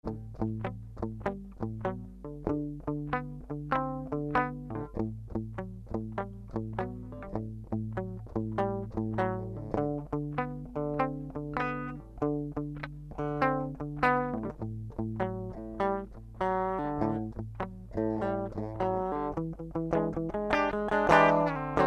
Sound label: LP Custom Bridge